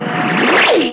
Electro FX; Ueb HE Electro FX

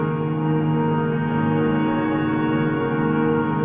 super lush thick ambient pad loop; Pads

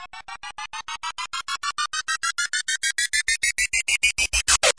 two tone stutter climber fx; Electro and Synthetic

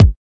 kick

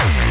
obnoxious kick

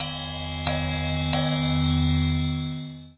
three ride hits

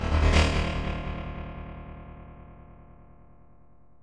wavering bass; Basses

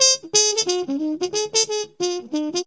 acid jazz trumpet; HORNS SAX TRUMPET BRASS

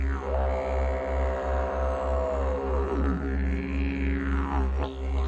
didgeridoo

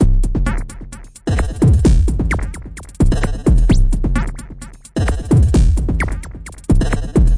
analog, drum loops and breaks; 121 to 130 bpm; soundsonic; berlin

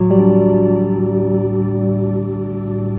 ambient strum sustain; Pads

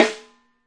complex live snare; single hits

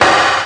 sp12, EMU SP 12; DRUM MACHINES; Kult

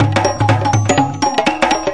123 mideast shuffle, drum loops and breaks

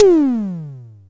Simmons SD-5 drum machine

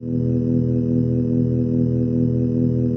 octave bass; Ze Franks Pain Pack

